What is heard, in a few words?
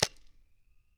from side, guns; vm-68